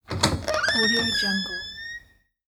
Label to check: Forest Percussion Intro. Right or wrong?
Wrong